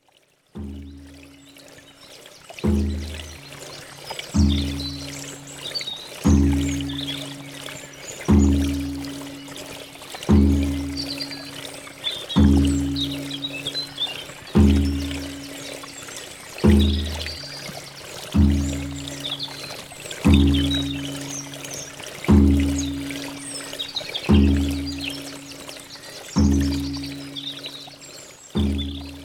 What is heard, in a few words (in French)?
musique chamanique; chamanisme